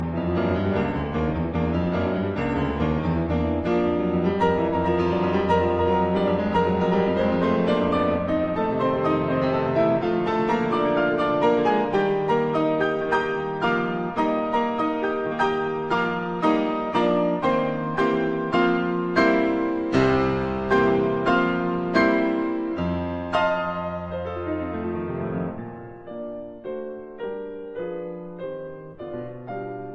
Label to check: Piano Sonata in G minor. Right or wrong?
Right